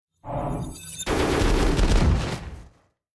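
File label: trap meteor; monster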